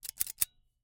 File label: Ice cream Scoop, metal, mechanical, teeth, gear, squeeze, release, double; Eiravaein Works; Vaeyan IV